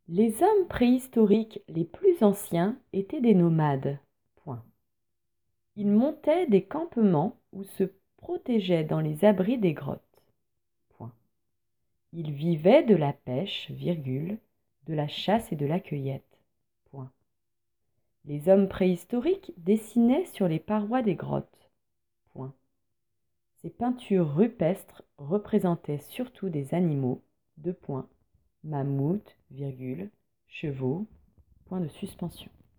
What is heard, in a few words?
Vocal